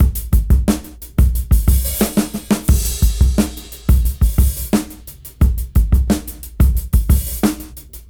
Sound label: Fat Break 89 BPM; AMG - Keith LeBlanc Phat Butt Jams